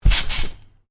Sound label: Trampoline, bounce on: medium bounce on canvas trampoline; Miscellaneous Sport